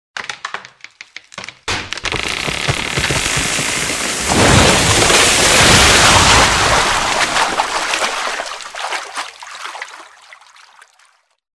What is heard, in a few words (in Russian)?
padenie dereva v vodu